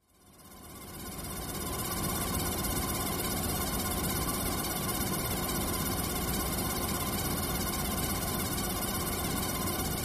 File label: Squeaking Machine, variable speed